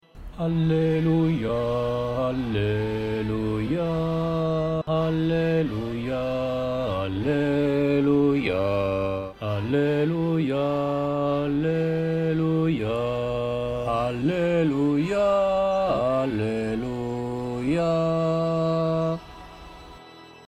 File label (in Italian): alleluia bassi